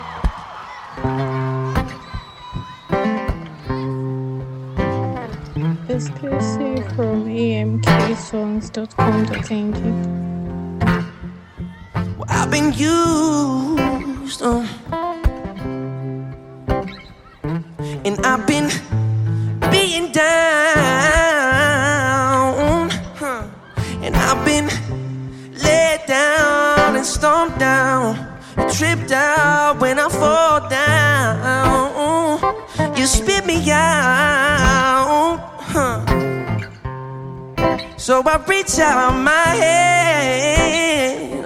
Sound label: voice memo acoustic